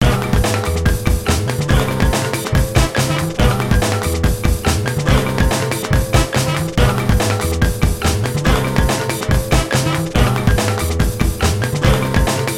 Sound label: sea battle middle boss normal